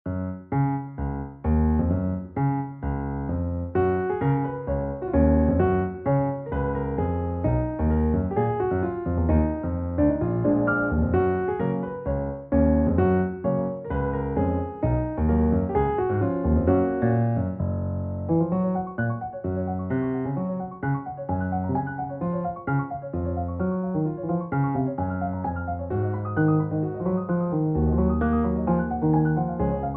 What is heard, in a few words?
bgm